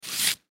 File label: Surgical Tool Pick Up Acrylic Paper; Paper Towel Surface Surgeon Surgery Large; Hospital; Emergency